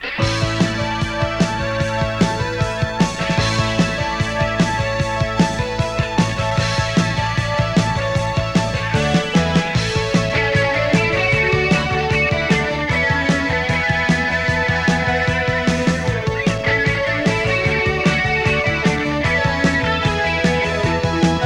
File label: Summer Instrumental